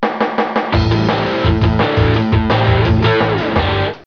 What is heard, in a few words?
Level Riff; Ambrosia Software; Maelstrom 1.4.2